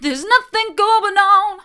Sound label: theres nothing going on mid, vocal